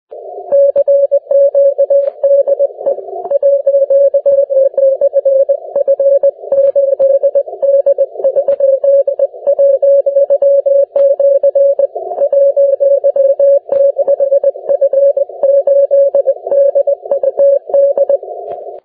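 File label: 40m continuous wave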